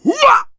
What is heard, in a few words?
Clowns, clown throw; Peeps Clowns